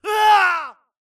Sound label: grunt death crush; Titanfall 2